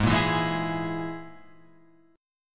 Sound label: G sharp Suspended 2nd Sharp 5th G sharp, guitar; x,x,6,3,4,3,6,5